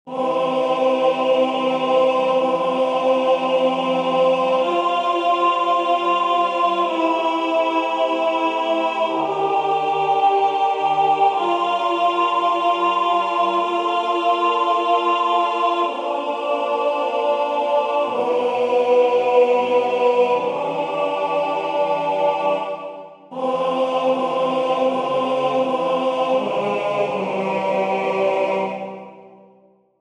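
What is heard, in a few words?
Presence 3 part alto, wisdomchant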